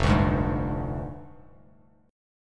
F Minor 6th Add 9th F down Bass Standard; JGuitar

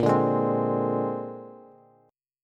A sharp 9th A sharp Bass; strummed; JGuitar

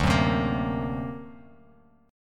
D Augmented 7th Bass Standard, chord; D+7 strummed; JGuitar

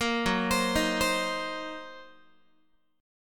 Gb 6th Flat 5th Gb down Guitar; Listen to Gb6b5 arpeggiated

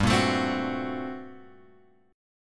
G Minor Major 11th, G; {3 1 X 2 1 2} strummed

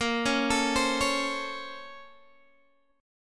A sharp Minor Major 9th, down, Guitar, Open D

